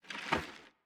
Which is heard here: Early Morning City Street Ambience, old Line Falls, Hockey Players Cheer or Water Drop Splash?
old Line Falls